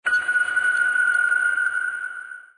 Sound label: sonar Ping